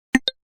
Drill Out; Tecno Spark 40C

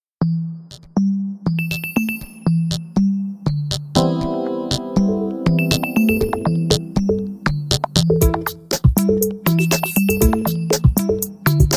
Tinkle, alarms; Vodafone Smart Mini

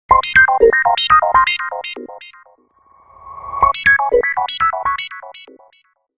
Ring Gamma; Microsoft; Ring Tone Pack 5

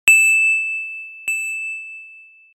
Steel, Ringtones; Samsung SGH-F310 Serenata

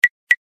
Hammer; Coolpad

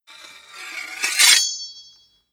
Stereo Sword; Lenovo; ZUI 13

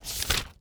Flicking R to L; Pantech; Discover P9090; ui

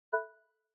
alert error; Google; Material Design sounds; Secondary System Sounds

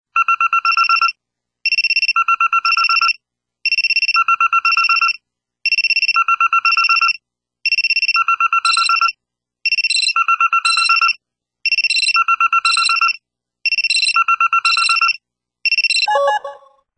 Alarm Wake Up; Samsung SGH-i200

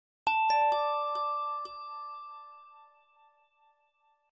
Bell; Alcatel OT 916D

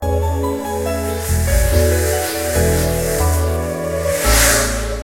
Startup; Samsung SPH-A700

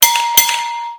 music alarm doorbell, alarms; Samsung GT i7680

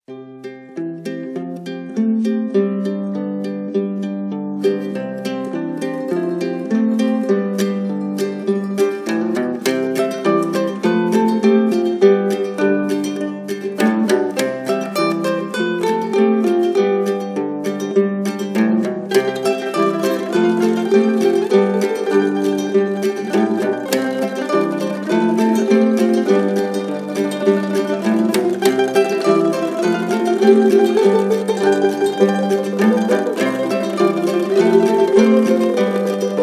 Simple Times, Windows Mobile ringtones; Microsoft

